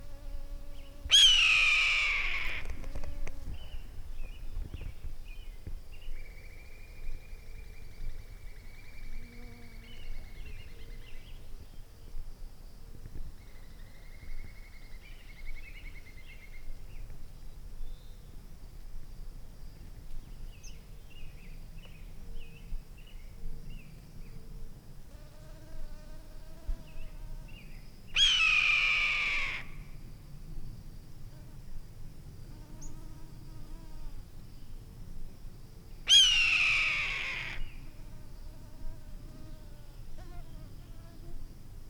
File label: Red Tailed Hawk